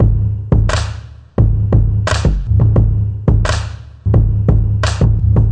tribal kick n snare, drum loops and breaks, 098 bpm; 091 to 100 bpm